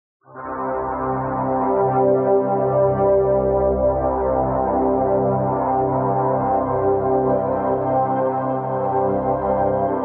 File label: strings; Magic And Spell Sound Effects